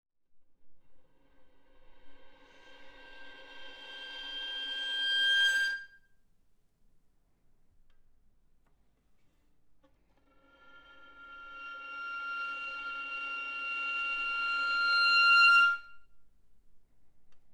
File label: Violin sound; Karin Hellqvist